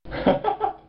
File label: laff